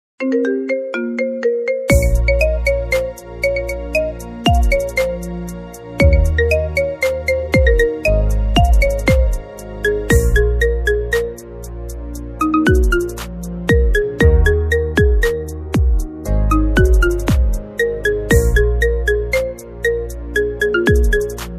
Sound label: marimba remix marimba ring